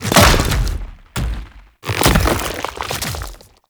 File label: Biped Entry, Enemies; Warframe; Man In The Wall